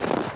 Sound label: player snow; firearms